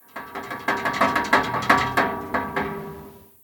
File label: Metal Bars Random; Devour; The Town